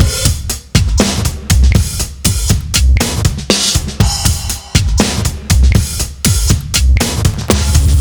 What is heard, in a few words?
Industrial A, alternative